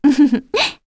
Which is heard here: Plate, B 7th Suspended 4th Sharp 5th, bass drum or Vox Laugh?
Vox Laugh